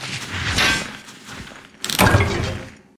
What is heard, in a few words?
Mining